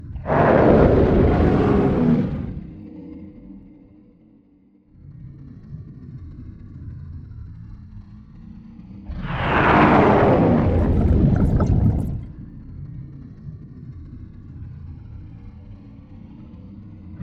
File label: ENDWORM idle Loop